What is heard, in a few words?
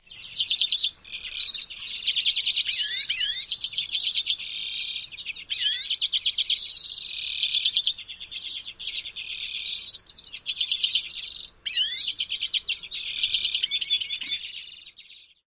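Common Redpoll group calling and singing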